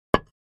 Patio Block Impact, concrete & brick

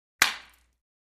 Juicy Impact

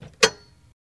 Jeep Chrke Tire Mount, jeep cherokee 84

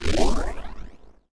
SPACESHIP FLYBY

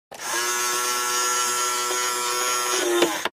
toy motor; toys

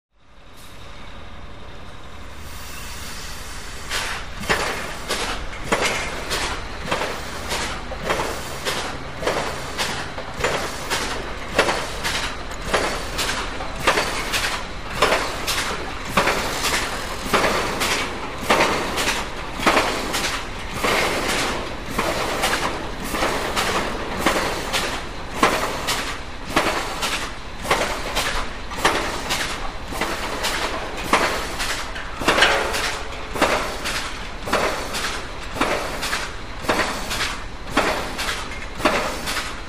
Pile Driver Start Up

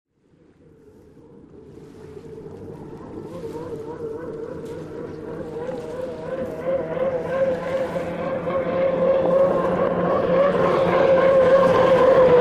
Hydroplane By Splas

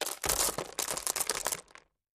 Landscape Rock Plast, rocks & dirt

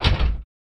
Car Door Slam Int Hit

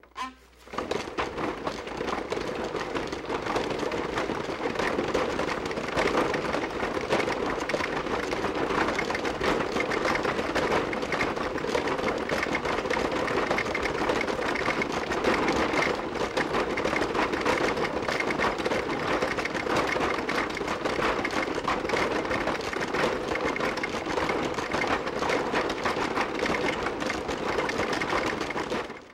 Exercise Bicycle

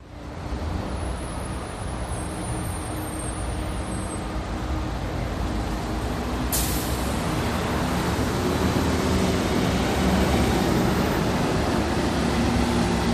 Traffic Gridlock, big city

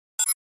Flash Beep HF Dbl Beep

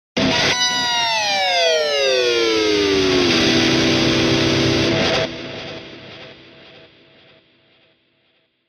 Electric Guitar Scream

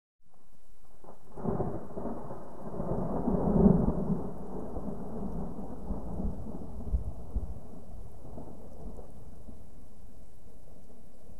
Thunder Receding, distant

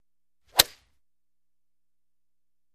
Golf Tee Off

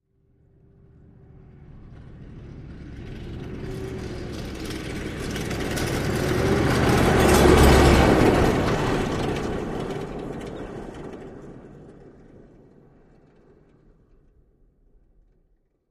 Humvee Fast By Rattle

